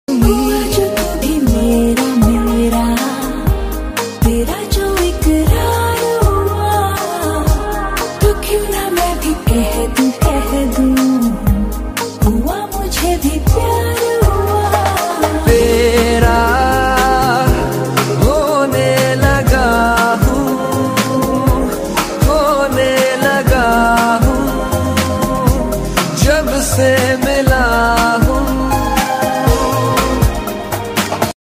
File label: romantic; ajab prem